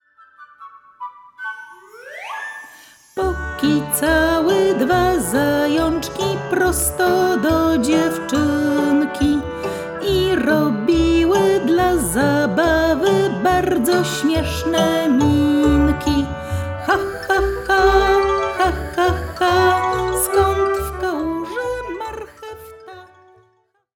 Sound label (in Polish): piosenka wersja wok